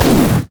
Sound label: dragon basic attack; LoL; monsters